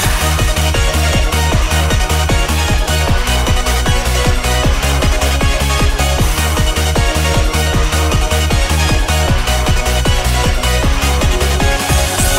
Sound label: eurobeat drift